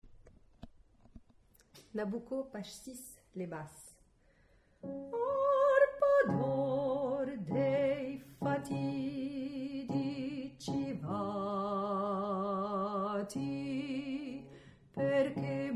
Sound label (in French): Basse; nabucco